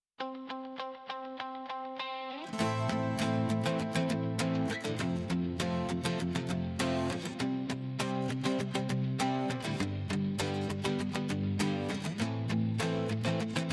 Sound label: Instrumental